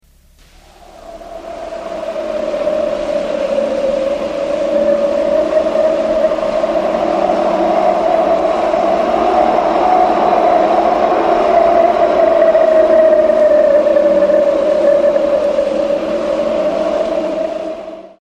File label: Wind